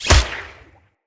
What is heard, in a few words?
Hunter Bow Ranged Shot Variant; Weapons; Player; Envoy; Bows